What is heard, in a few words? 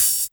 OPEN HH; drum